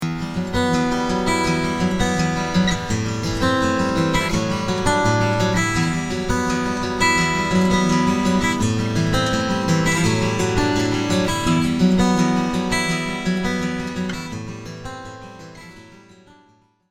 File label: TAG1E FINGERPICKING, acoustic guitar; Yamaha TAG1E; guitars